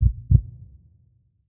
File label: heartbeat